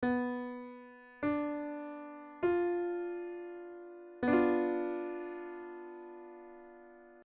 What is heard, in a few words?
b dim